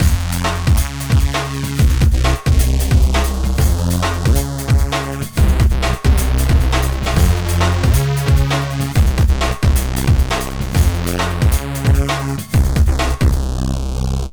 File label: Dirty Hertz, 134 Cmin, Hardcore Breakbeat; Big Fish Audio; Hardcore Breakbeat Action